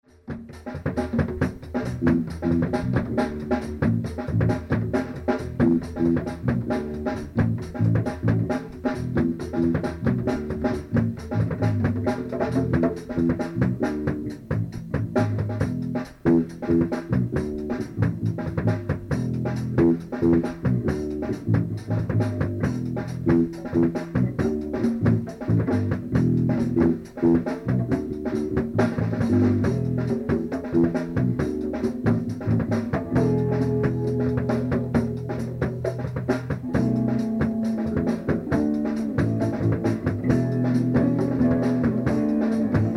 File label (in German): Percussion